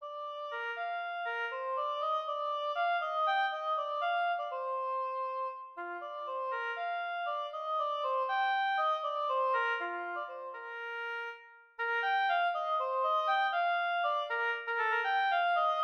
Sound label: oboe